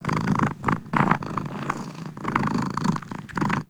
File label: Kitten Purr; Warframe; Catbrow